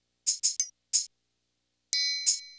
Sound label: Natural Tamb, Percussion; Beat Components